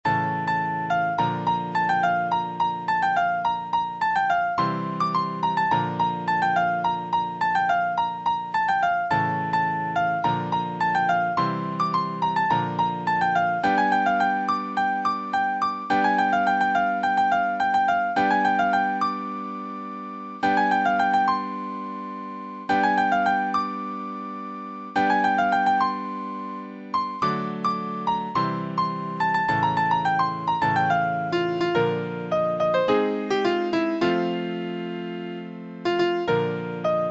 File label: Piano